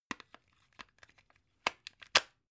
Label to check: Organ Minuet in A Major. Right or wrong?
Wrong